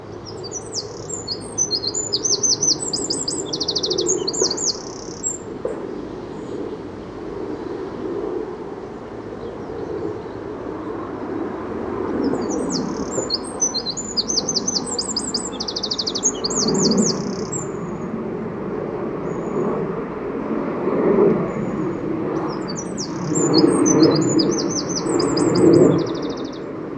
Karietaitė - Eurasian Wren - Troglodytes troglodytes